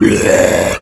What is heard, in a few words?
death satyr; dota; creep deaths